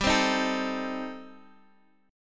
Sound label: A Diminished, chord; Adim/G; strummed; JGuitar